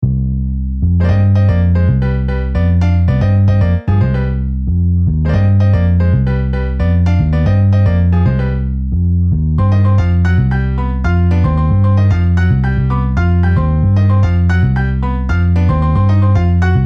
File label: Piano Bass MIDI